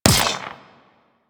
Drone Rifle Fire; Warframe; Amalgam Drone; Weapons

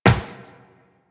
Run Metal, Footsteps; Warframe; Tenno

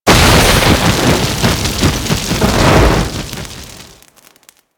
Flame Burst; Explosions; Warframe

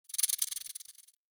Corrupted Critter Close; Ambience; Mendicant Area

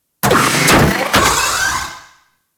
Door Open; Warframe; Liset; Hub; Lower Deck

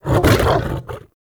Wolf Attack Growl; Animals

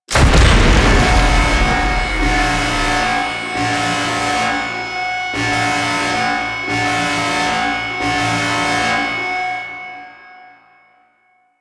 Defense Start Wave; JadeShadows; Ascension

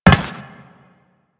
Run Metal, footsteps; Enemies; Warframe; Teshin; DE

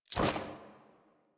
Generic Weapon Light Aim Transition Out; Warframe; Weapons

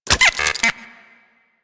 Lure Move; Warframe; Teralyst; Vomvalyst Lure

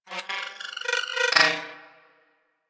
Beep Stutter; Warframe; Ambience; Corpus

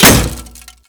Bullet Impact Metal No Whizz; BulletImpacts; DE; Warframe

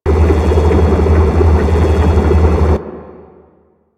Gas Refinery Mach Loop; Ambience; Warframe; Corpus Gas City

